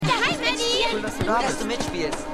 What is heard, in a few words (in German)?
Kindermenge; "Was du mitnimmst" ("What You Take with You"); The 100